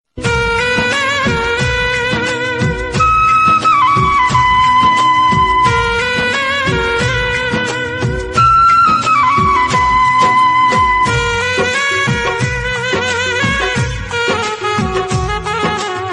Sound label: old music